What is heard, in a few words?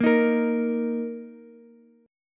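E flat 5th E flat, down, Ukulele; strummed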